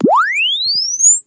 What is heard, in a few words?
press one finger Chirp; Samsung 4 Recordings